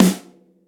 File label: fluffy snare sound f sharp key